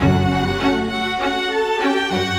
Strings; Sonic Foundry (Sony Creative Software); Orchestral Series VOL-4; Rock and Pop; Rock-Pop 05

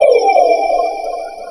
CLAP; CLAPS; USB Soundscan vol.20; Fresh Disco House I; AKAI

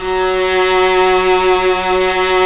VIOLA F#; 3D Sound - 3D Keys VOL-1

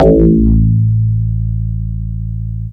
bass techno; Ueberschall; Techno Trance Essentials

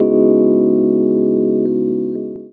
Chords Tones; Equipped Music; Loungin House

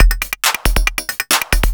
percussion loops 138, Techno; PS-45

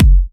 Sub Kicks, Bassdrums

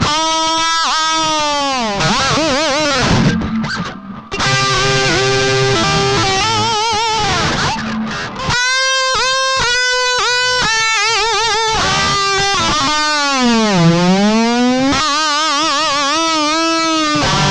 Guitar Licks

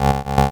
Emu Swing, Emu Modular Drums

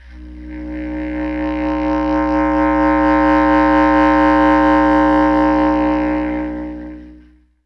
B. Clarinet

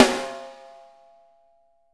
6X12 WOOD

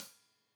Hat Loose, Hi-Hat, Cymbals Stereo

